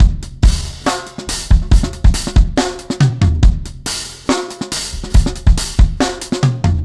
Brown Half-Groove 4-Bar, Breakbeats, Drum n Bass; KJ Sawka; Drum n Bass & Beyond

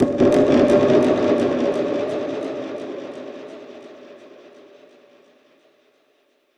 Perc Hit, dub percussion; musicradar

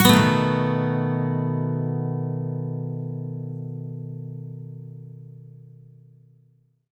MAJ SWEEP B, Electric & Acoustic Guitar; USB Soundscan vol.04; AKAI

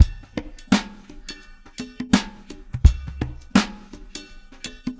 LO-FI; Spectrasonics; Liquid Grooves 1 & 2

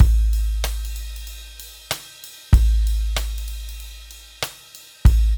DRUMS, Funky Ass Loops; East West

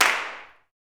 CLAPS, Gospel; CLAPS+SNAP

